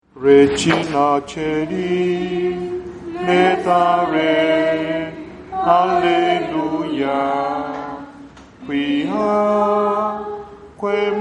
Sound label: Hymn Regina Caeli; easter octave 2015